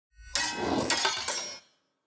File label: ui battle begin; Shelter